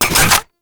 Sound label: Pump Shotgun Alt Mech B; Weapons; Warframe; Zariman